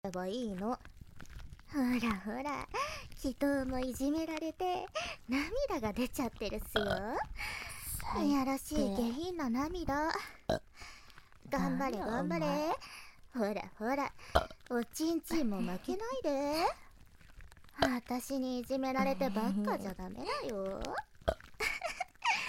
両耳ゲップ責め; ASMR

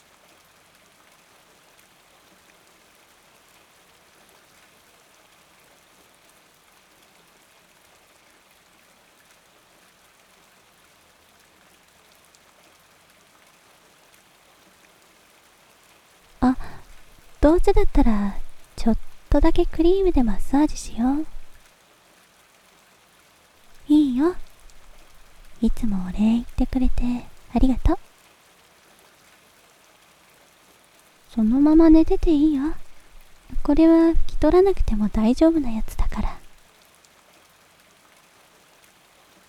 クリームでお耳マッサージ, ASMR

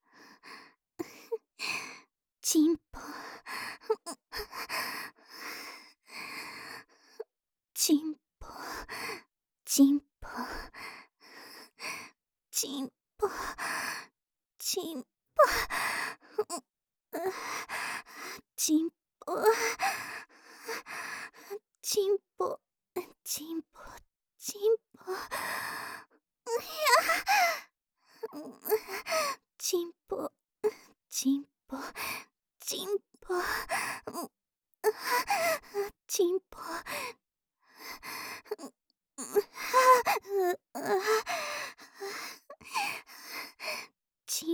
しっとり 喘ぎ; オナサポ

